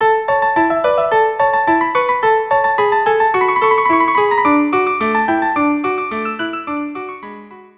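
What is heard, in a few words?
violin duo; Prelude to act 1; carmen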